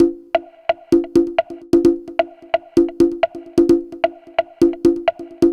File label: beats